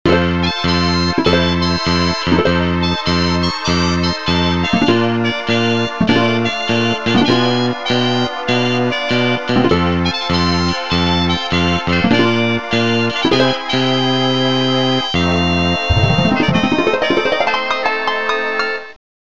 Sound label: Game Over; Donkey Kong Country 2: GBA